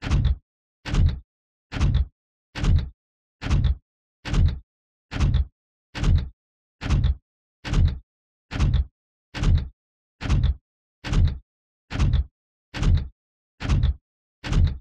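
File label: robot sounds; zvuki robota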